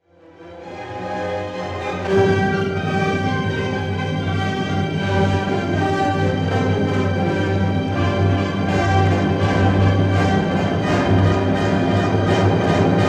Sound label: Symphony No. 7 in E III. Scherzo. Sehr schnell trio. etwas langsamer Scherzo; Bruckner